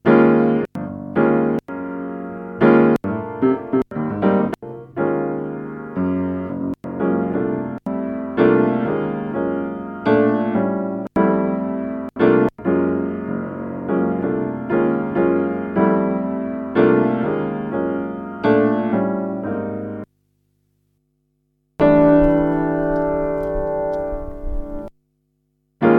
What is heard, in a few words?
Piano Chops